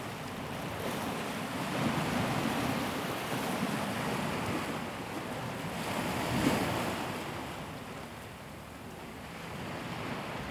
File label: Gentle Waves on Beach at Playa de Cura Gran Canaria at Sunrise